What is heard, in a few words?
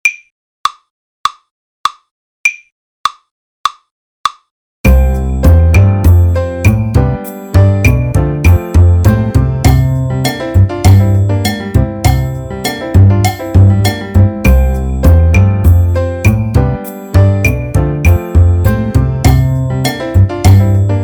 Kit Solo F